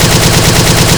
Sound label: Assault Rifle Shot Loop; SFX Kit; Weapons